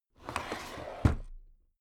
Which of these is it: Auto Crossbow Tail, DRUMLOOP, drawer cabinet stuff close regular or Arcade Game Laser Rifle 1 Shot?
drawer cabinet stuff close regular